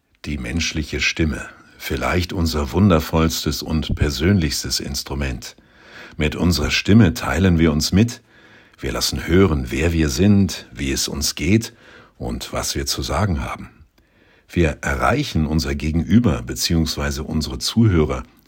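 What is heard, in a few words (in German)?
Stimmtraining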